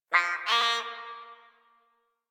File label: Flying Robot Voice